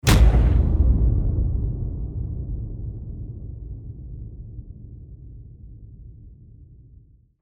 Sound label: Epic Low Hit